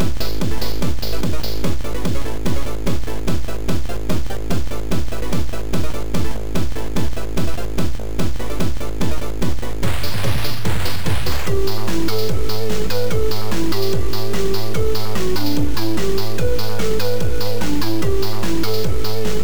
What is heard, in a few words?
BEATS